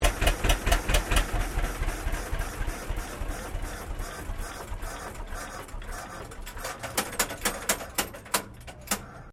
Truck Thornycroft M2 40 Shutdown, Classic comercial vehicles; 1923; Truck Sound Effects